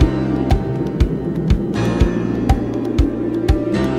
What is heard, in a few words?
piano, Sad; loops